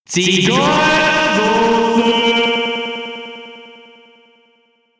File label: shout